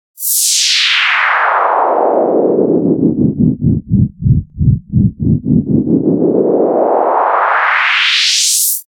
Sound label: Noise Sweep